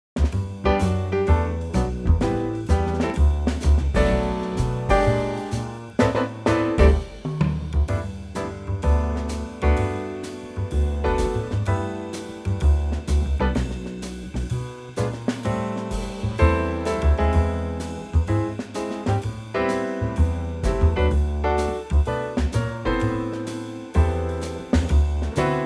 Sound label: G Ab Trio